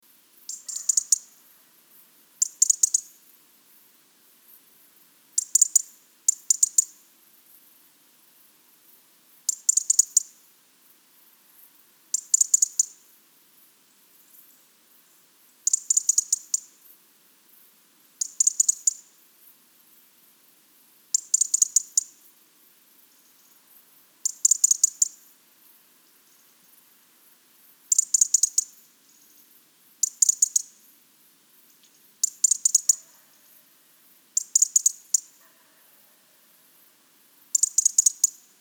Erithacus rubecula; SETE

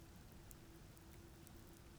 pulses, BALAENOPTERA; MONACO 2022-07

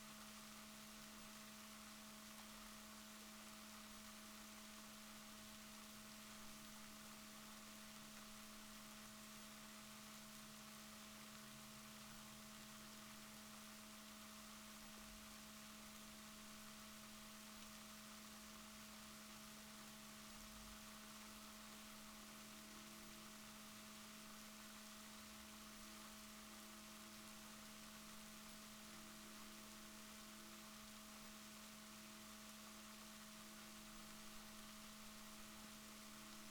rorquals positif, Balaenoptera Physalus Chile

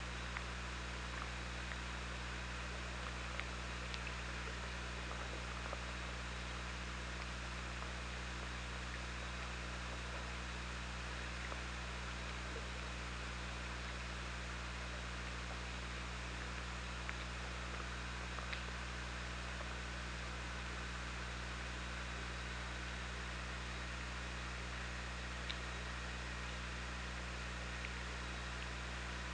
OrcaLab

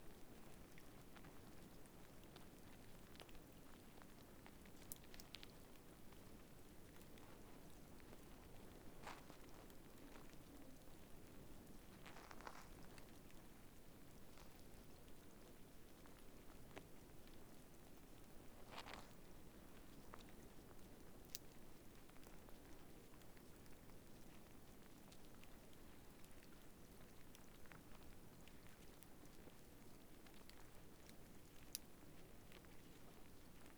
sonar

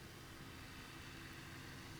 pulses, BALAENOPTERA; MONACO 2022-07

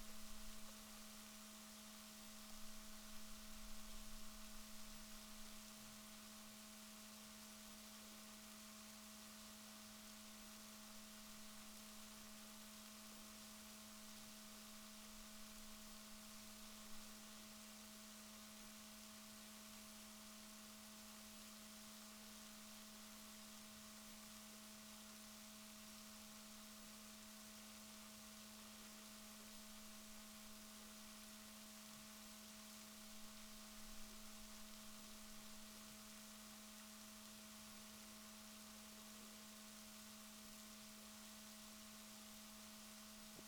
rorquals negatif, Balaenoptera Physalus Chile

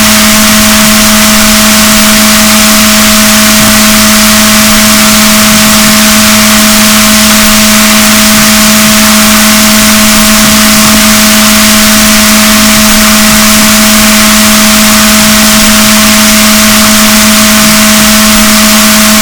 rorquals negatif, boat; Chile

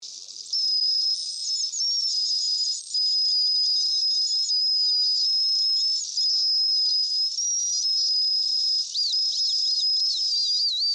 False Killer Whale, Voices in the sea

